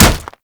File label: Staff Impact Flesh; Warframe; Weapons; Impacts